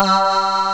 PPG VOICE, SYNTH VOX; East West - Global Sounds

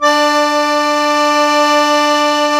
KEY Cajun, Cajun Acord; Club-50 - Foundations Roland; Accordians

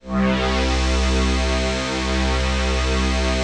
CHRD PAD; Best Service; XX Large Pads; Sample CDs

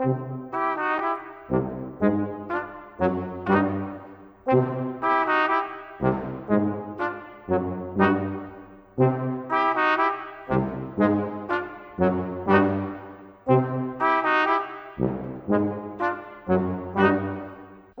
Brass, Cinematic; Sonic Foundry (Sony Creative Software); Orchestral Series VOL-3; Cinematic 22

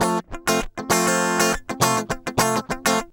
100 BPM

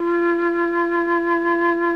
MFLT 10F3, MELLOTRN; Sonic Reality; Vintage Timetraveler

